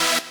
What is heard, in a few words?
lead